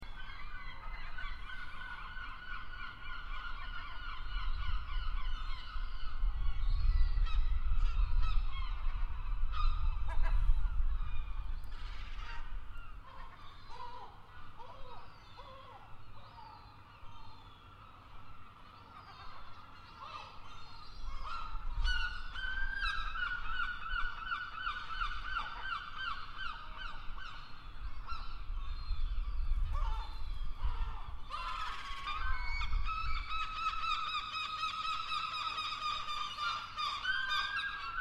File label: gulls from street